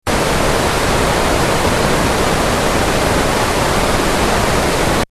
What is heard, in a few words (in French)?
bruit rose